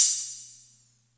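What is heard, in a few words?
Atlantex Music Percussion Computer hi-hat open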